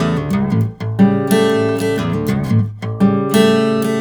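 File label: baritone riff 120, blues guitar; ILIO; Fretworks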